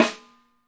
complex live snare; single hits

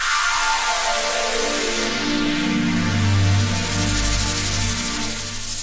super hipass sweeper, Pads

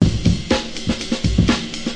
124 bpm slow, drum break

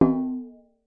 Processed Ethnic Percussion

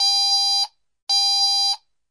clock radio alarm; Electro and Synthetic

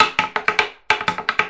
72 high knocker, drum loops and breaks; 000 to 080 bpm

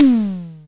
Simmons SD-5 drum machine

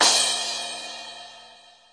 CRASH, UK Hard Kit; Hip Hop; STYLE KITS (Hip Hop, Etc)

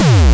obnoxious kick

